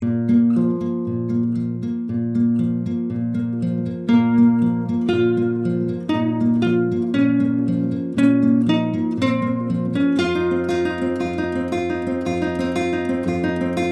Bard Instrumental Loop

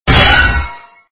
SFX sound (axe hit)

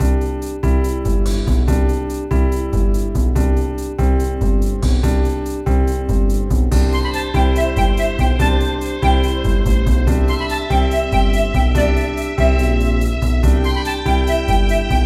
Bloop Mix

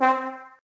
C2 Trombone

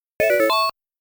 Credit Sound

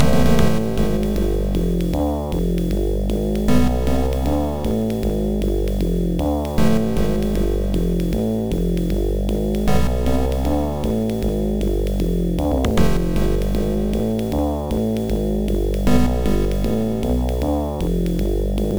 Jazz; General Purpose 13

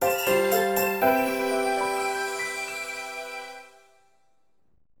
Jingle Mission Clear

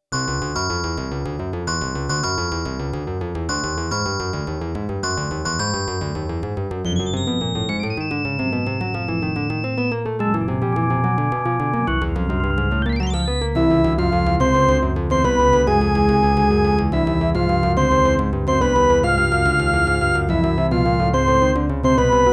Boss Bgm

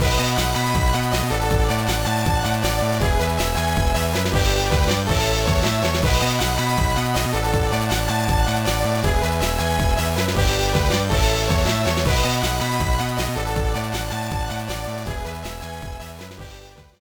Victory; rpg tsukuru advance 2003 gba

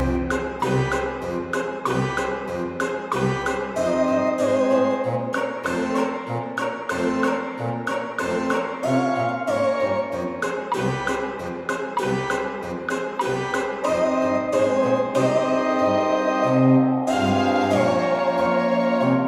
sting ghost spirit collect; The Sims 3; Windows

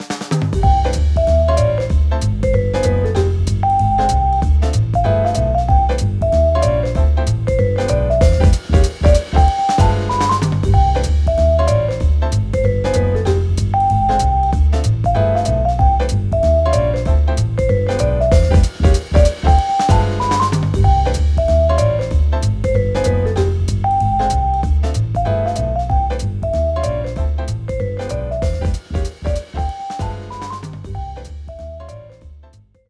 BGM Trump Win; Clubhouse Games: 51 Worldwide Classics

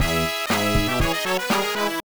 Win

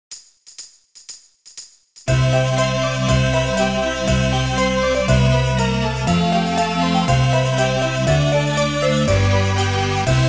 Jingle Bells; Street Fighter III New Generation; arcade

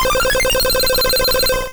Hyper Beam; Pokemon; Gen 1; attack moves; RBY

